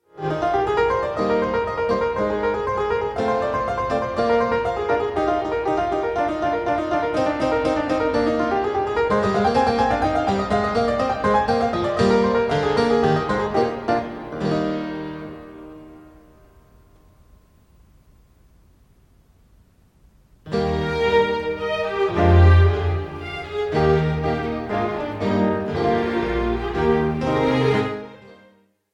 concerto in A major for keyboard and orchestra

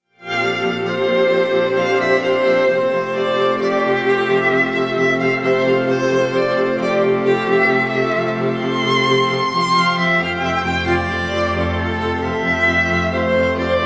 duet for 2 violins and strings; smith quartet

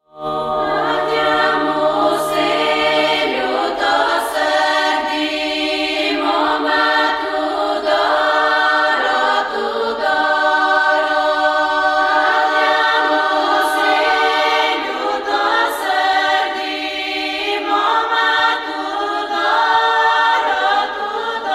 female vocal choir; bulgarian state radio television female vocal choir

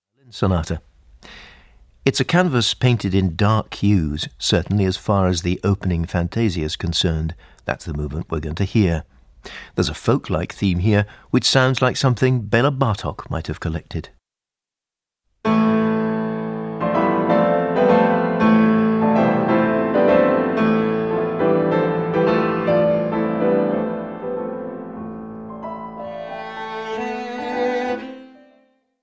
sonata in a minor for violin and piano; ralph vaughan williams

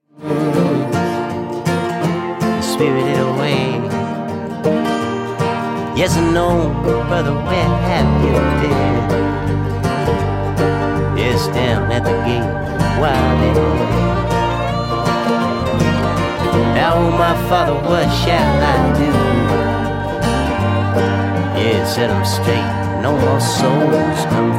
drum

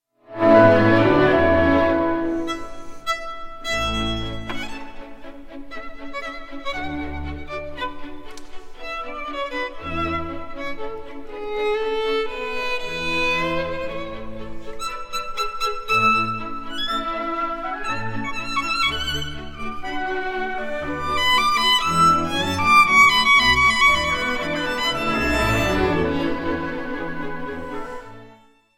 violin concerto no 4 in D major; Wolfgang Amadeus Mozart

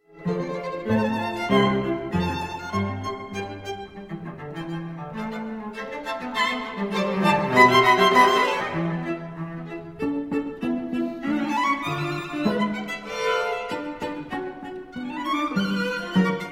allegro molto leggiero, string quartet in e minor

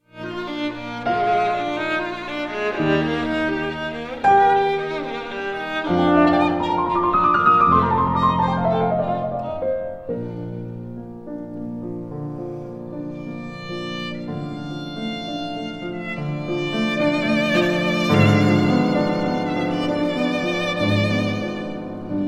violin sonata no 6 in a major op 30 no 1; ludwig van beethoven

